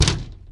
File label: glass hit; hits